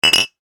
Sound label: Glass Clink; Bar; el colmillo de cobre